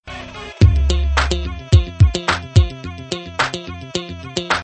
Hip Hop

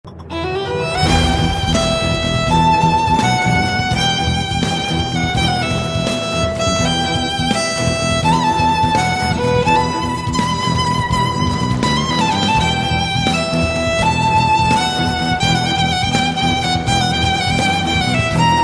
Violin; The Last of Us

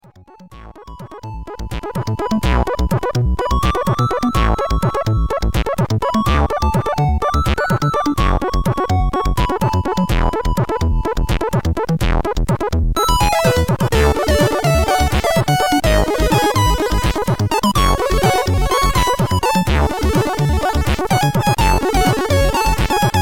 Ambient

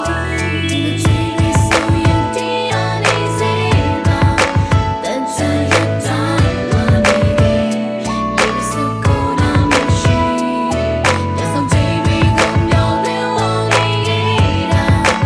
Burmese

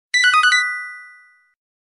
Siemens Tones; 4 Tones

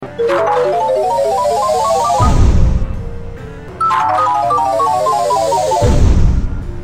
Paper Stairs

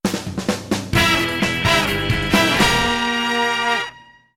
Flourish SMS; TF2

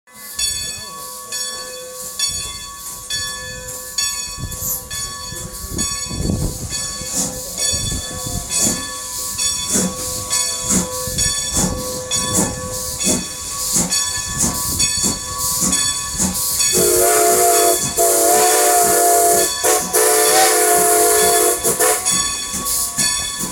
Steam train starts

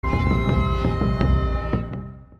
Whistle; MANDALORIAN

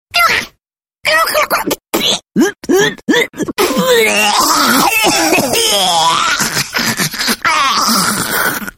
Puking Parrot

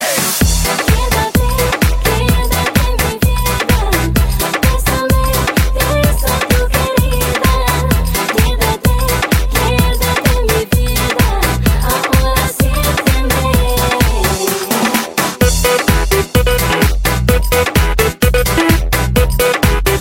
Club Sound

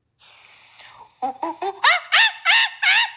monkey; Julia

